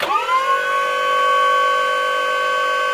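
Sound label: minigun wind up, weapons; tf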